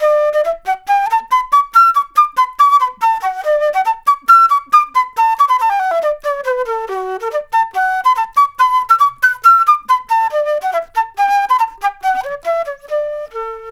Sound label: Flute Solo, Jazz; Flute Loops; Sonic Foundry (Sony Creative Software)